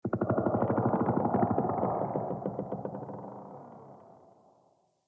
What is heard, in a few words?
Corrupted Two Trees Talk, Ambience